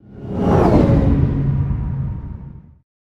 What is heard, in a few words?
alien fly by